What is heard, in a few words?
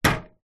impacts plastic small hollow hit x1